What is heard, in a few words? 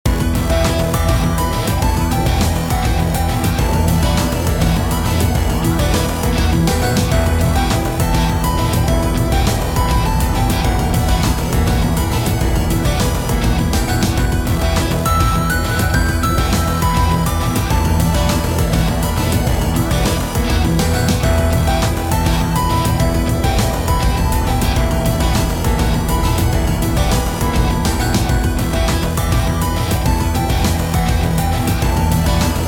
Loop (204bpm)